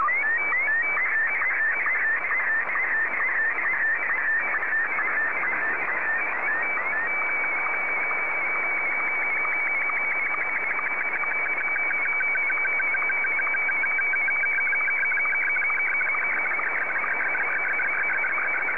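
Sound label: Martin 1, slow-scan television; JA3QJQ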